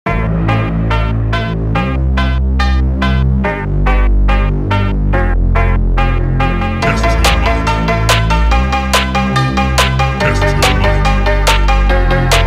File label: phonk remix